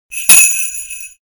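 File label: jingle bell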